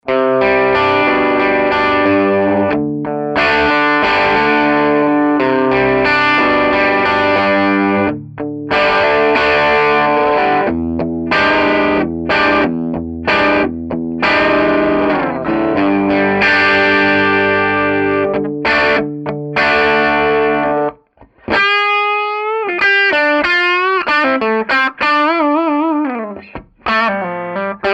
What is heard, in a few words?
crunch bridge; schwarzbach HB59 CreamGen